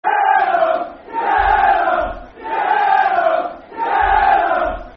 Football Chant (Soccer Songs); Yellows, Yellows; Dagenham & Redbridge Drfc

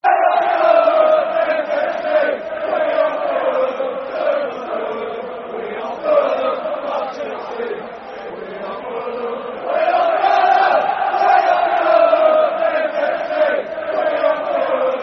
We Are Fulham, Football Chant (Soccer Songs)

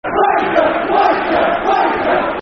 Football Chants (Soccer Song); Wanker; Wolves; wolverhampton wanderers; fanchants

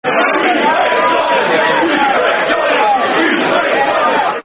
We Hate Tottenham, Football Chants (Soccer Song); Chelsea Cfc

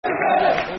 Football Chant (Soccer Songs), Port Vale; Sinnott's Barmy Army; Port Vale Pvfc

